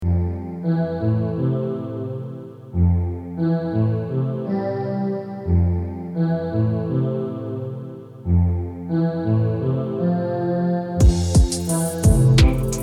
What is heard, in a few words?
New Age